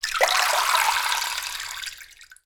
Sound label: household water pour from plastic toy collender into bath water